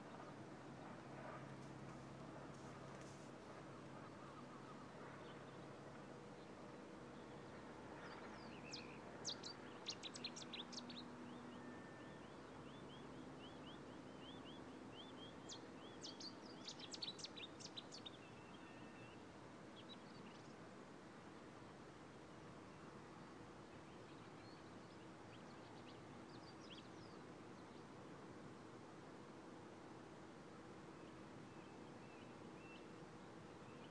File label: bird call in reedbed